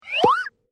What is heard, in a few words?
growing